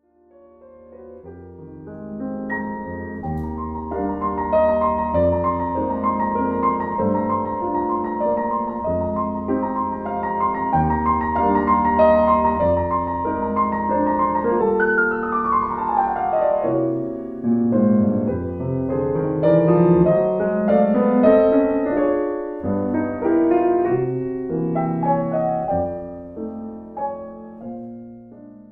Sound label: Waltz in E flat major